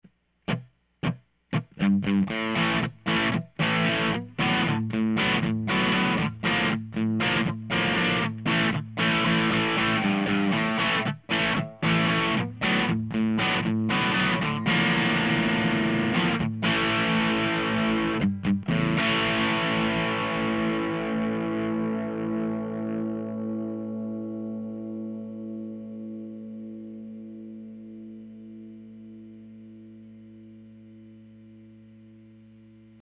dist Gtr; Spark

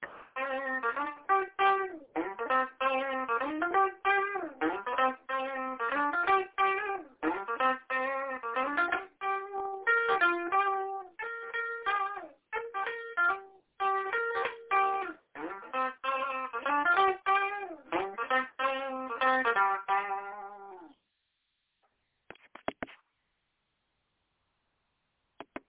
whiny blues call and response lick

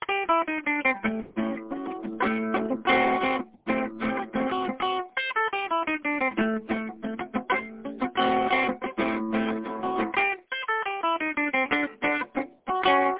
interesting 12 bar blues

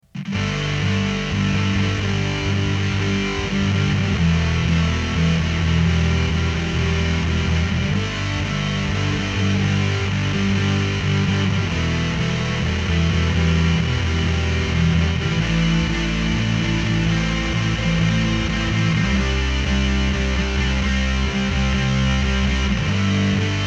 fuzz Like Synth; just made a fuzz pedal, and was messing around; The Question (technically, it's still untitled)

